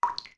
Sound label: Beneath Well Droplet; Zelda; OOT